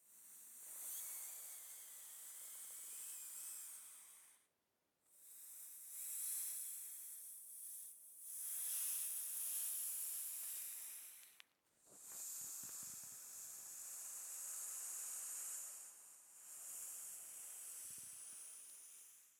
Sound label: Escaping Air